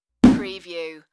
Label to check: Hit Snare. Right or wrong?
Right